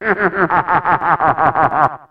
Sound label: laugh; Kackle